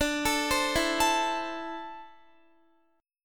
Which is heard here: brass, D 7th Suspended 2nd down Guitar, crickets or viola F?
D 7th Suspended 2nd down Guitar